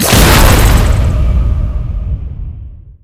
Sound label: blood explode; garrysmod